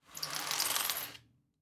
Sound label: Household Window Blinds Pull Up